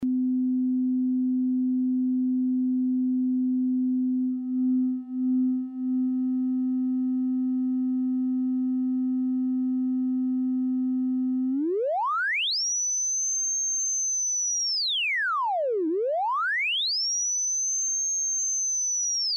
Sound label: Sine Osc Compare, Frequency Shifter; SergeModular; Frequency Shifter Samples